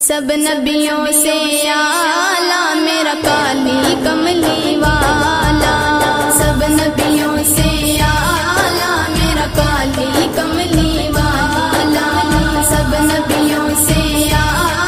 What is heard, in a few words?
Islamic Ringtone